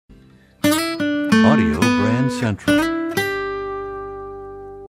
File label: Desert Wind, radio jingles